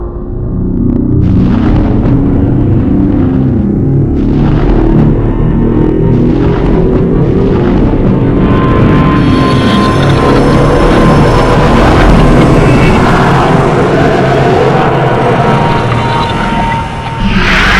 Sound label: warp; titanskull